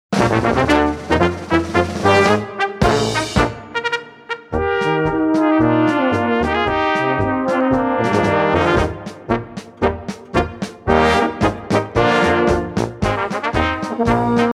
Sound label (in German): polka